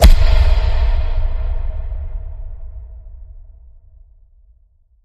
Fast Serve Airy Boom

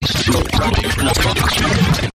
Big Garble, Taylor Grand

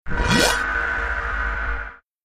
Vortex

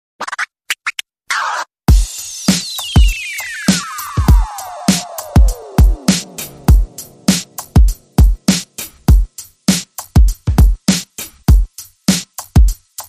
Beat

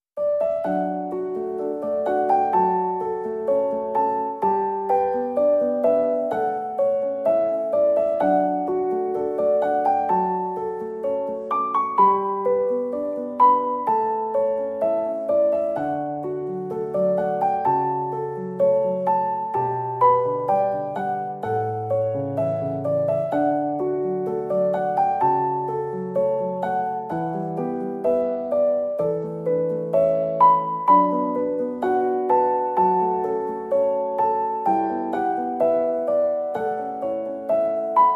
Piano

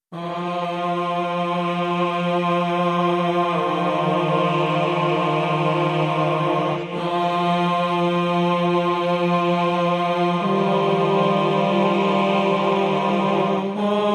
Male Choir Stem

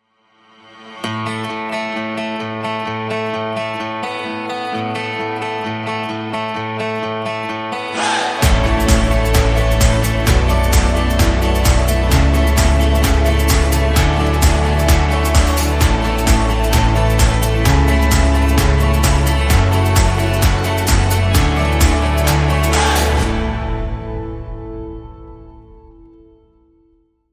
Guitar Freedom, Rhythm

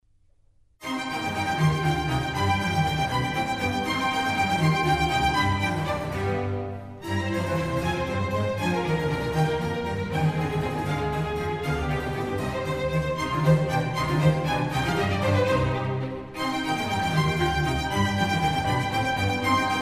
Concerto for Strings in C minor; RV118; Antonio Vivaldi

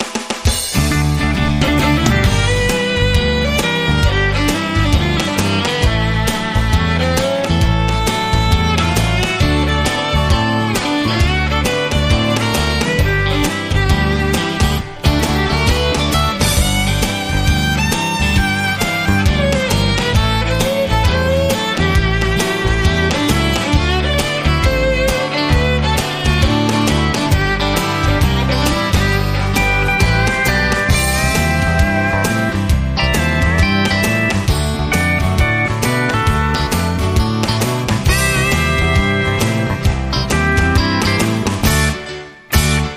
Country Boogie

